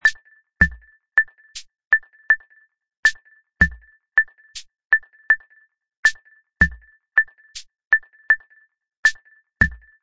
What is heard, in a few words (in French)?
clave bombo appui; rythmes cubains